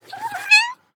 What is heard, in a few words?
Kitten Meow; SES Animals SFX